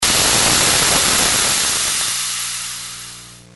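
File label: deflating, motor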